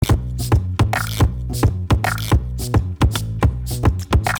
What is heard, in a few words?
loop